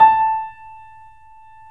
3D Pianos Yamaha C7; E-MU Producer Series Vol. 5

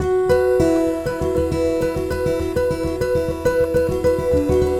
Guitar And Synth

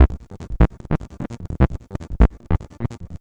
Bass, Futurist Drum & Bass

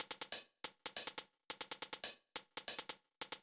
Beat - Hats, Grime, 140bpm; Zero-G Sound Sense Grime Scene; GS Construction Kits; Just Flex

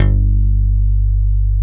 G-1 PICKED, METALLOID; AKAI S-Series CD-ROM Sound Library VOL-7

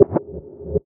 Reverser; Electronisounds

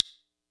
kit, Yamaha Motif-ES8

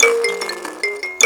PLK Buzz Kalimba Phr; Roland LCDP11 Africa VOL-1

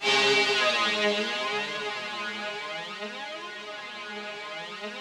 G#2, ROUGH STRNGS, Yamaha VP-1; 90 s Sample CDs; Music Store

